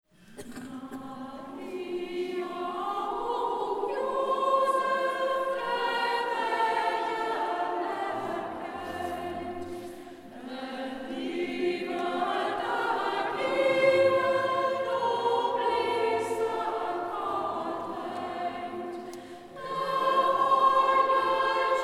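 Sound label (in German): Maria uch Josef (E sachsesch Chrëstlied), Siebenbürgische Kantorei; Umgangssächsisch; hermannstadt; hegt wird gesangen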